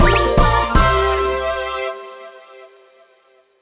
Round success; SFX Hiddenstage

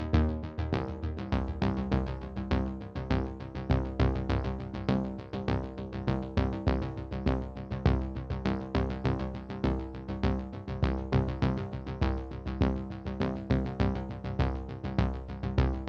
Tension Bass; nova 111; ps vita

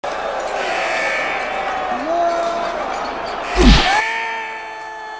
Fence Hole; Fleeing The Complex

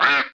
Nature Error

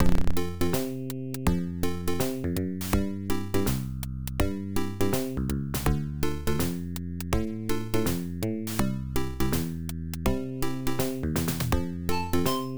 Reggae; Adventure Tsukuru 98; PC-98

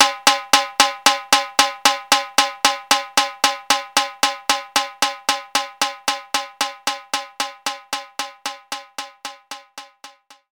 Timbale Rim; knights try

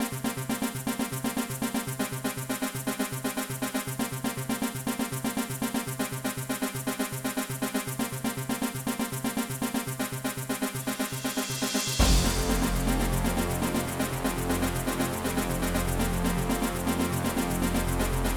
VICTORY [Edirol + Omega]; Abuse

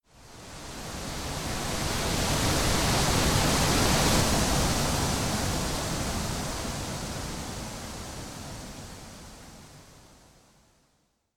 Bgm Talk Wind; gensou shoujo wars dream of the stray dreamer

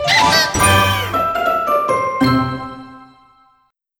Crunchy Finish

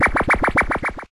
Tail Whip; pokemon reborn e19 2022

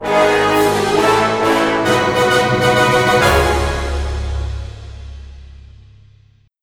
Fanfare; Planet Gaspar

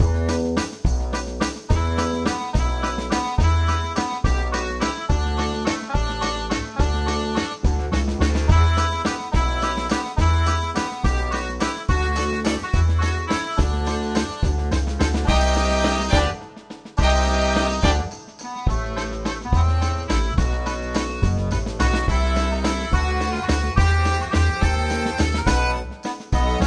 Music

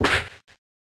Double Slap; pokemon reborn e19 2022

